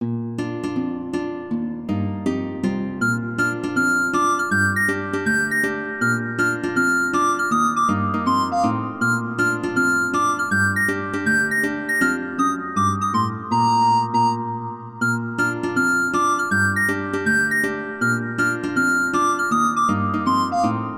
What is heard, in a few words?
haruiro recorder